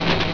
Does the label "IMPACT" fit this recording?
Yes